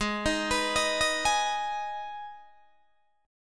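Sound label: Ab Minor chord, Guitar, Open D tuning; down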